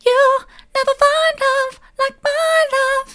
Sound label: soft, vocal